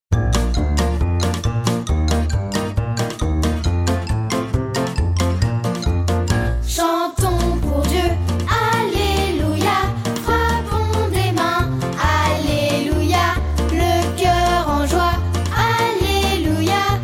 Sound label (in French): chantons pour dieu alleluia